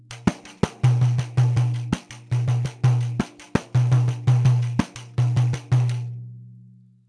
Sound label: Cuica